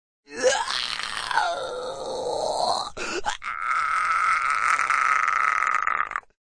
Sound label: Man In Pain, A man in pain